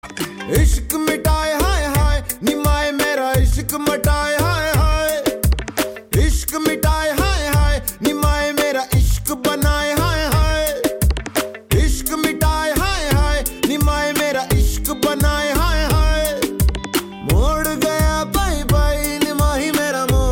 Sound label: ringtone